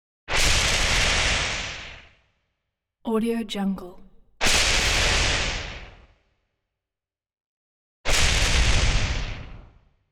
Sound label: lightning strike sharp whipping bursts; prosoundfx